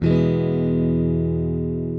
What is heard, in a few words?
guitar velocity